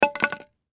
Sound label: Fire Wood Log Single Piece Drop; Fireplaces; Foley; Household; Blastwave